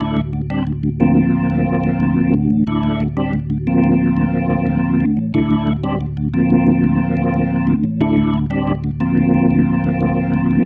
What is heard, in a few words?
Pattern, Organ; Sonic Foundry (Sony Creative Software); Joe Vitale Organ Donor